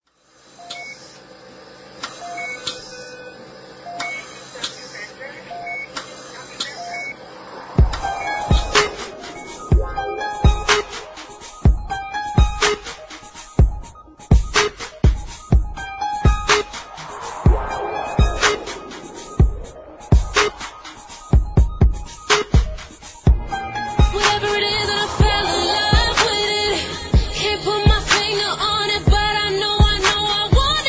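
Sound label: r&b, ringtones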